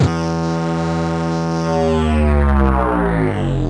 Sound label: 130 E, uk garage, Synths; 130bpm Lines n Loops